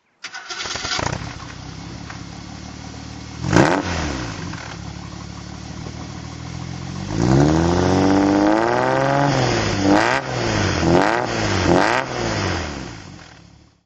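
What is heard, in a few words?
lancia aurelia b50 throttle; Lancia Aurelia B50 convertible, 1950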